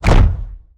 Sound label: Whoosh Arm; Evolve; Behemoth